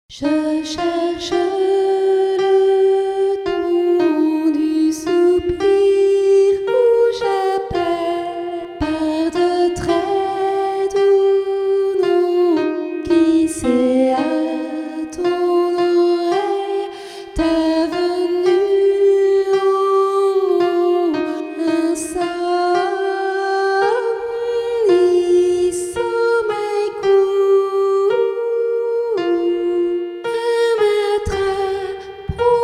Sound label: soprano